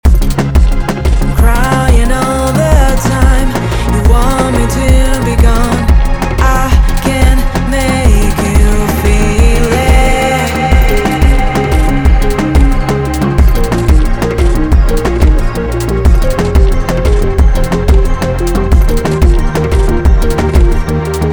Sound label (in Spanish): Ejemplo envio a reverb grande